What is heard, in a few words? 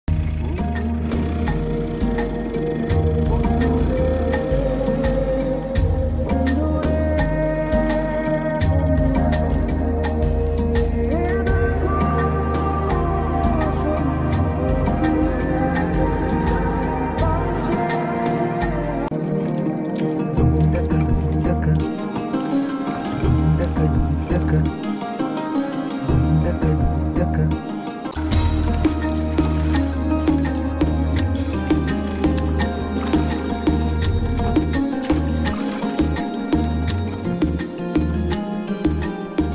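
love background music; shyam singha roy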